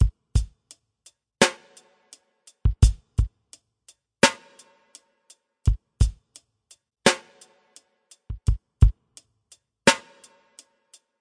085 bpm minimal kick snare story

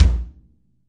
yam kick, Real Kit; REAL LIVE KITS; Multisampled Real Kit BJ-HD9

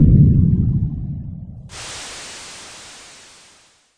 long ex; VIDEO GAMES

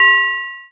clang; carnival

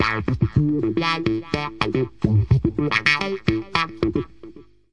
funkres bassline; MELODIC SAMPLES

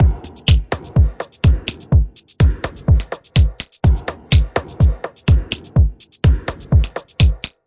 125 bpm, jiggy housey thud, drum loops and breaks

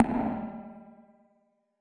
verby tone D2; Tonal; UNUSUAL KITS and FX

